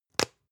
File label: spice jar smack open; industrie wirtschaft; gastronomie